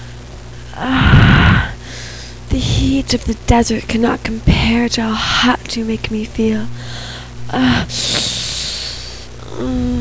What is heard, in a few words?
chick; Designer Bodies